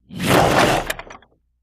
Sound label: Catapult hurling fireball